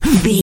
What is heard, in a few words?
voice male attack